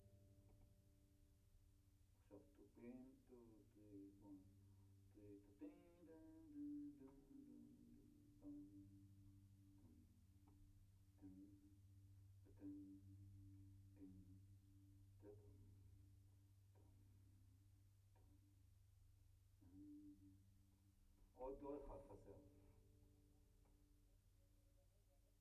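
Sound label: Contrabass DI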